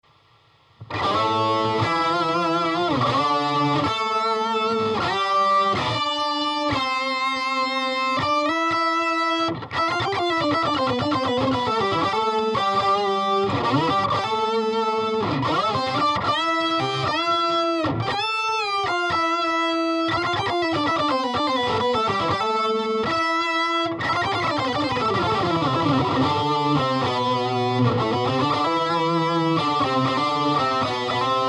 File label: Les Paul Lead Octaver